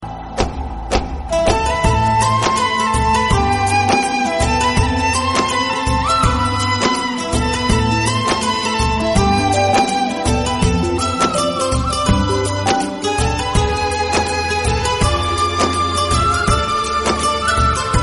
classical bollywood song tone ringtone

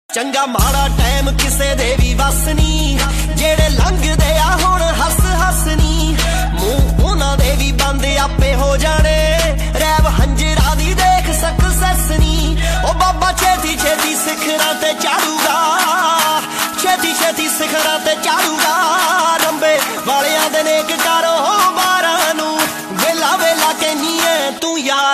punjabi song; bollywood

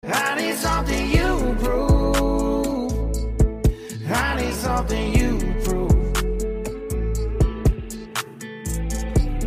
traditional ringtones for mobile

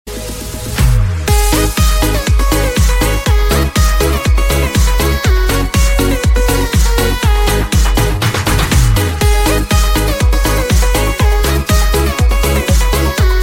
nice ringtone

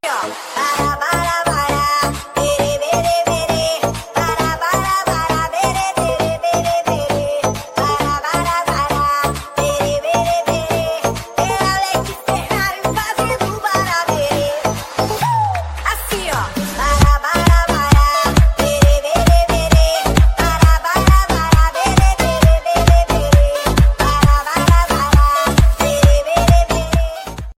funky dance ringtone cool ringtones

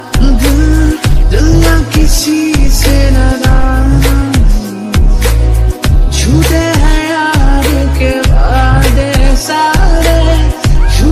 sad ringtone, bollywood

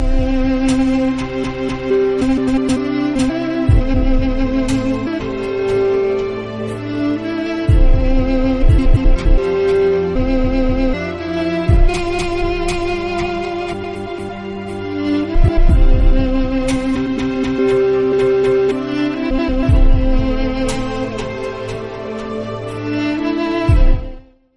cool samsung a6s ringtone; samsung ringtones

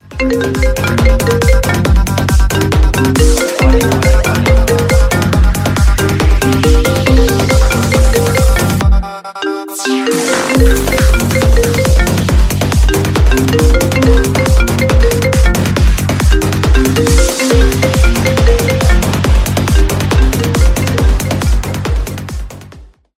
apple iphone se ringtone

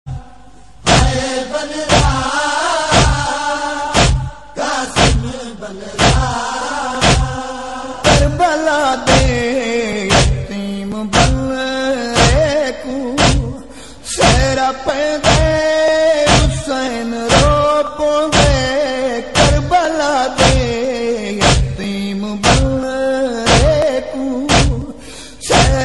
noha tune ringtone

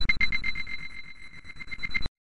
frequency ringtone

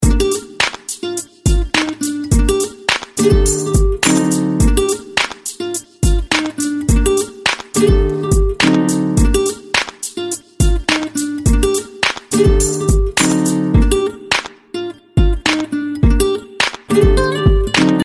spanish latin beat ringtone

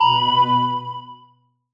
message tone for vivo ringtone, sms

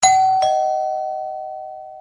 door bell ting tong ringtone

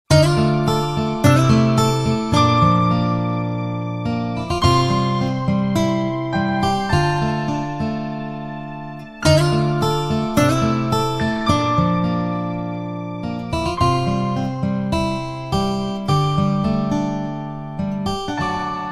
sad guitar ringtone

